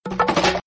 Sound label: skel die sound; Arc of Templar